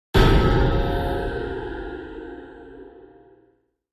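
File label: Impact Metallic Clang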